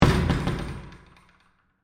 Stall Door Close, Stall, Door, Close, Bounce, Public, Bathroom; Office; Public Bathroom Sound Effects; SoundEffects-BlastWave